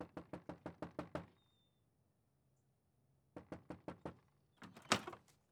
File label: Knock On Glass Muffled; Fairlight Sound Lib